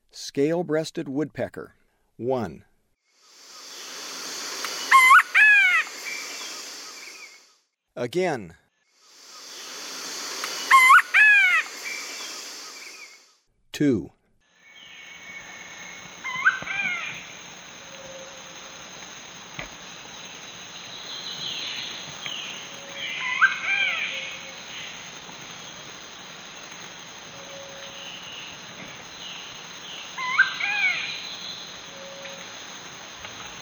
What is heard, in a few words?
Scale-breasted Woodpecker; S. America; Eastern Lowlands